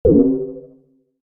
Space fx pickup, Spaceship Environment Style Pickup, Short; Video Games; Stuart Duffield